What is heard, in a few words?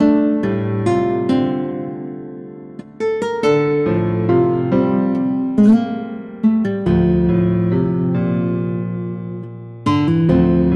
bgm comic key guitar